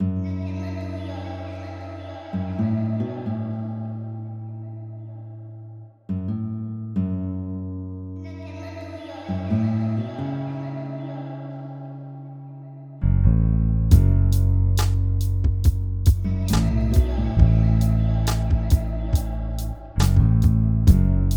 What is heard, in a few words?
BEAT